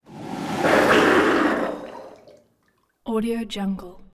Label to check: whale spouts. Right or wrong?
Right